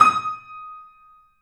D#, The Piano; InVision Interactive - The Piano

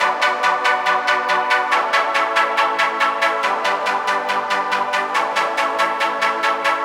140BPM B; Vengeance - Essential Clubsounds Vol.4

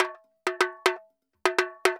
TAMBORIN; Best Service ProSamples vol.14; AKAI

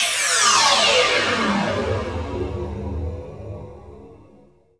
NEWSWORTHY, Trance Fusion; ILIO

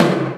Korg N5 Rock Kit C#3

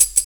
tambour, tambo shaker

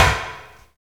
snare CLOGH, Roland; Roland L-CD701; Snares 3; Sn Modules 3

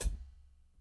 HAT, MICRO KIT; Spectrasonics; Burning Grooves CD2

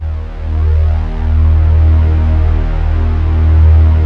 Prophet VS, Vintage Keyboards

